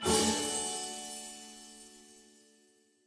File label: small Win; fairyhansel